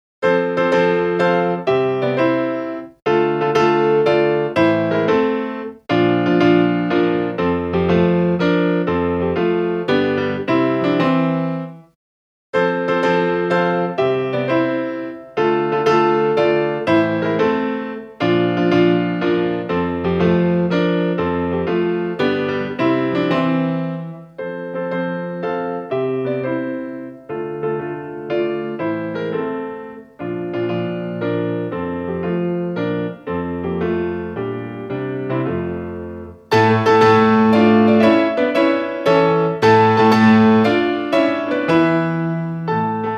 Eventide H3000 Chamber Piano

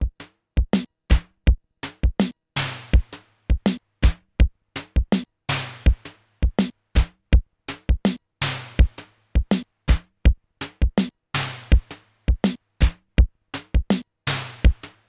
Simmons SDS 1000 Halftime

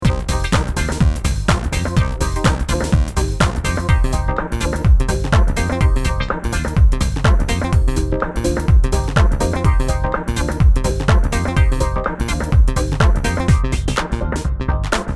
House Break

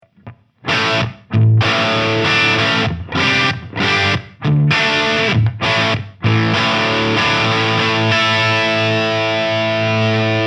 Revv 100R Channel 2

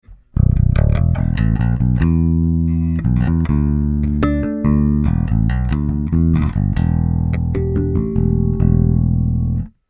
Jbass Fingerstyle Highmid Boost; HB Block 800b

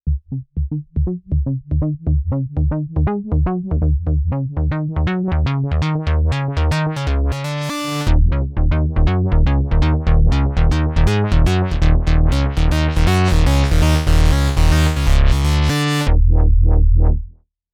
Synth EQ; Lang PEQ-2

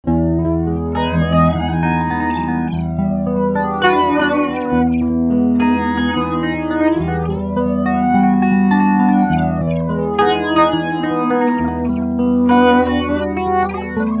Flange Delay

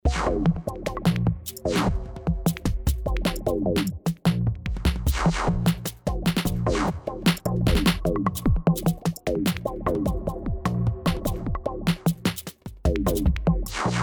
NI Battery 4 midget kit

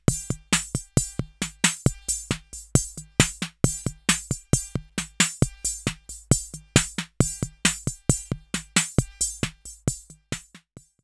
6equencer Loop

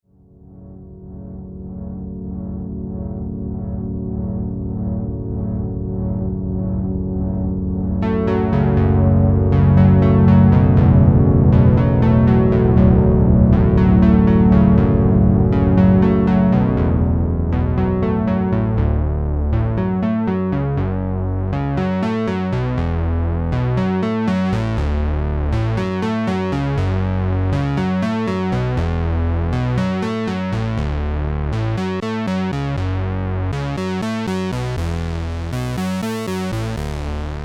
JP8 Fat Sequence

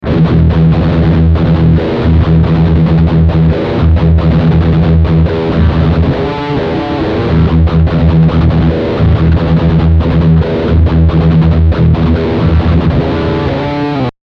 shred humbucker rear

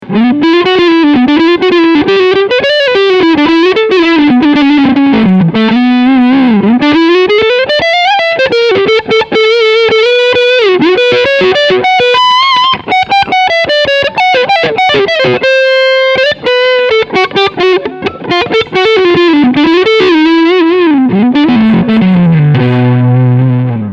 Leadsound Front PU

